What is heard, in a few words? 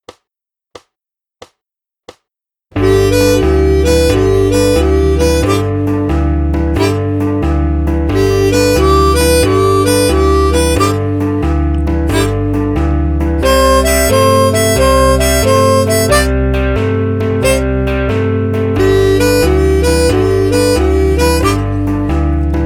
Warble Blues 90bpm